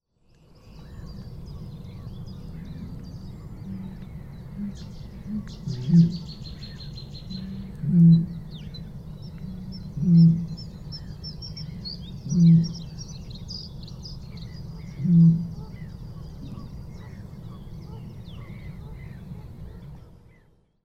Roerdomp Botaurus stellaris

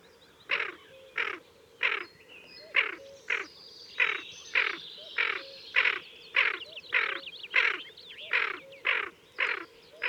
Kuifeend Aythya fuligula

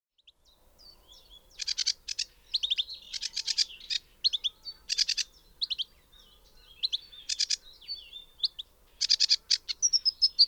Rietzanger Acrocephalus schoenobaenus

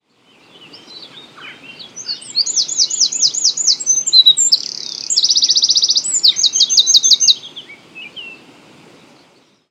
Winterkoning Troglodytes troglodytes

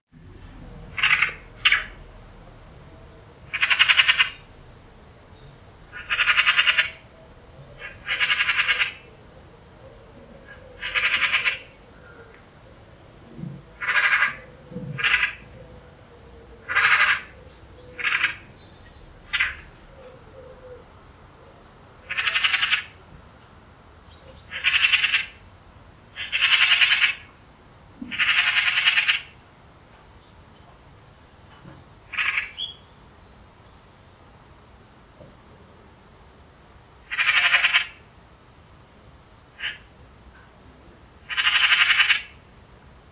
Ekster Pica pica

